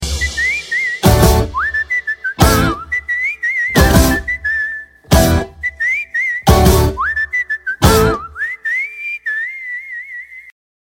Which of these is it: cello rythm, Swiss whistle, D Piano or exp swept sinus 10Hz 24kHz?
Swiss whistle